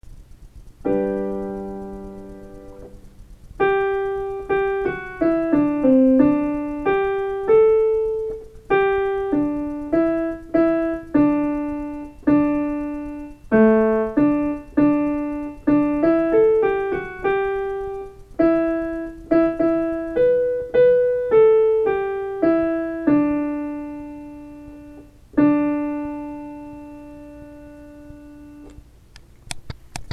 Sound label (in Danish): alt stemmen